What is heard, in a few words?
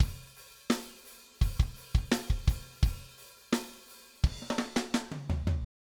Basic Rock Fill dry